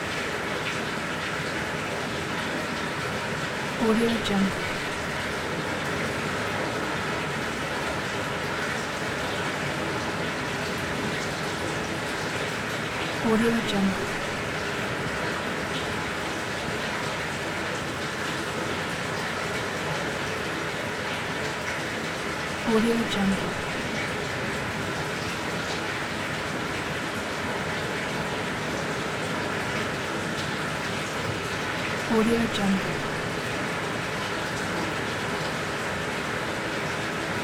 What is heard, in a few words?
sewerage ambience